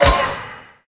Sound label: burn start; smite; god daji skin15